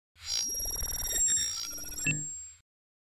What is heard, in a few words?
Remote Frequency With Antenna